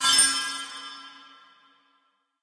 Reward cards Reveal Single

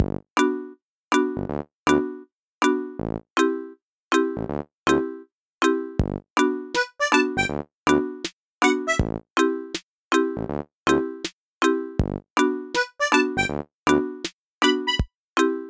Clock - Alarm; Animal Crossing Clock - Alarm 3; Nintendo DSi

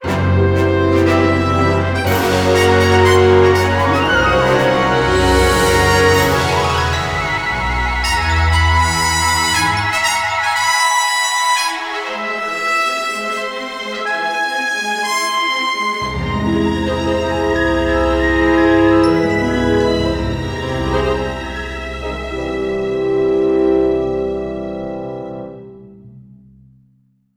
MiniGame Zombies Win; ShoreLine

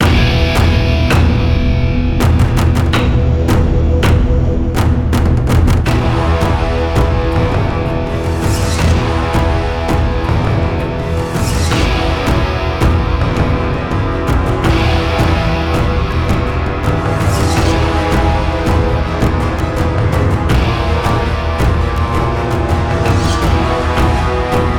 With Wind SFX; Ace Combat X2 Joint Assault; PSP; Turning The Tide

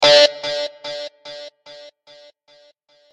Guitar D; pokemon reborn e19 2022

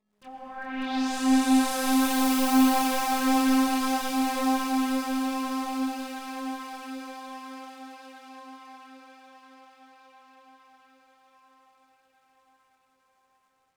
Oneshot Synth

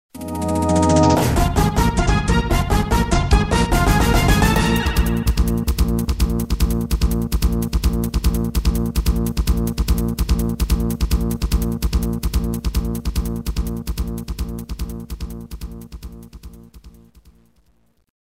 Stage Clear; Konami Shooting Collection; Bravo!

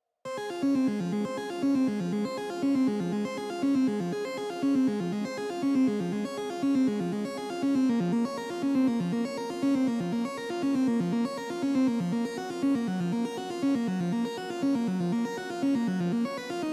Red Fog Analog Soft; Get Even

